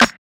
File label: snare; dough-samples; eddyflux